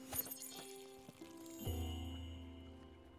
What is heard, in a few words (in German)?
fischen; spiritfarer